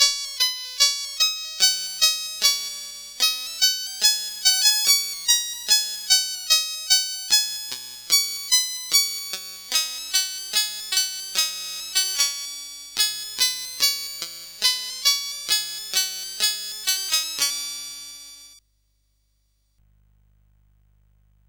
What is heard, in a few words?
sid, CSG8580R5; Battle of Midway